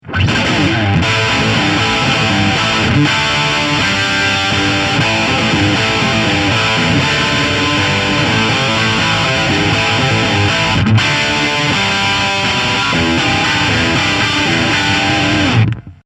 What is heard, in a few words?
Pyramid Nickel Plated Guitar